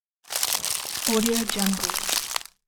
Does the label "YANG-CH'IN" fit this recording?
No